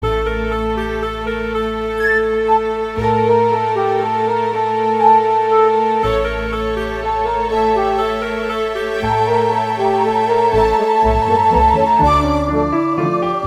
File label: Tarantella slow